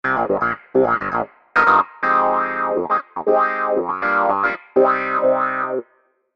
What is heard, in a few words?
auto-wah